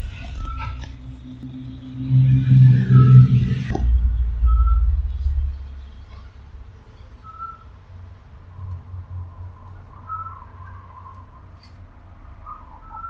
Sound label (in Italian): assiolo